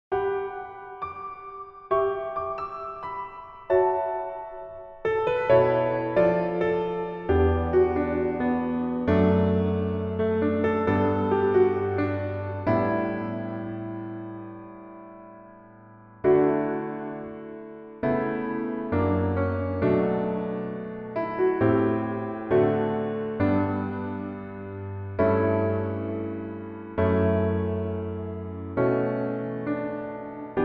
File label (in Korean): Piano MR -1 키